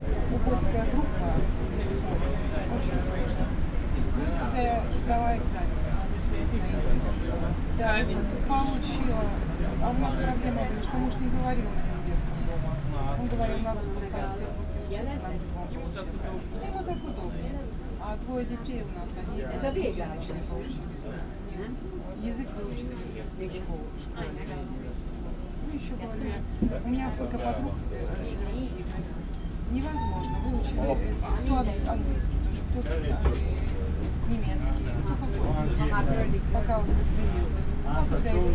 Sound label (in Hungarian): szélvédővel beszélgető utasok, busz távolsági; Dömös